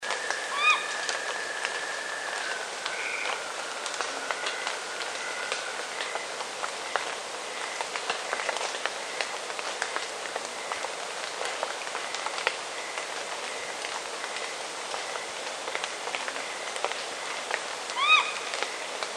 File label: Asio stygius; Grote ransuil; uilen; Strigidae